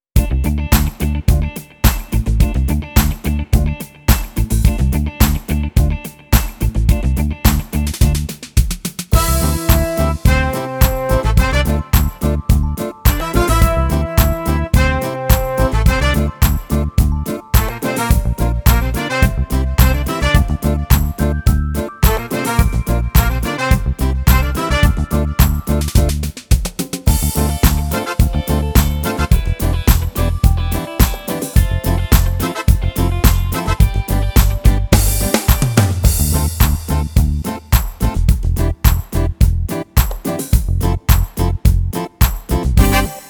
Hit Reggae

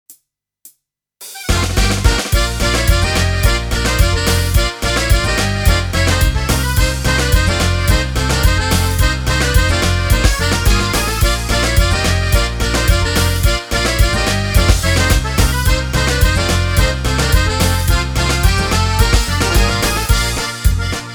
Folk Party

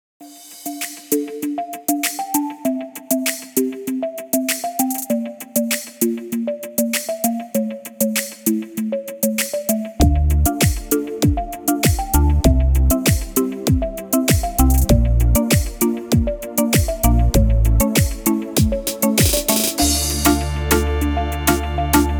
Tempo Beat

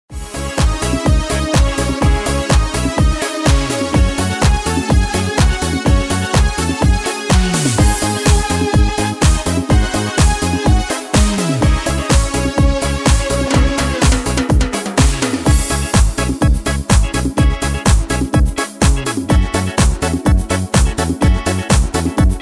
Disco

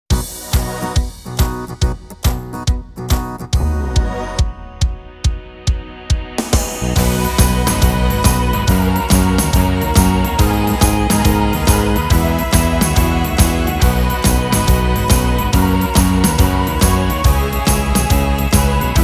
Oldie Pop

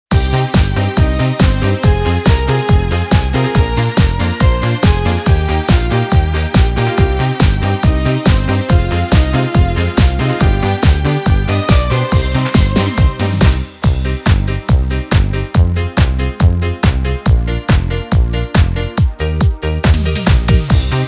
Disco

